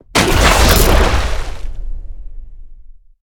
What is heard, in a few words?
wall of spears spears; odin; smite